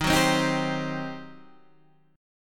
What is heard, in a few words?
G Major 7th Suspended 4th Sharp 5th, Eb